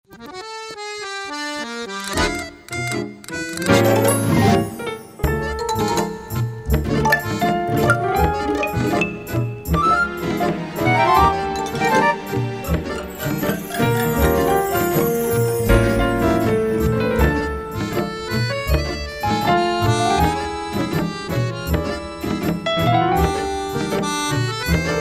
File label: Tango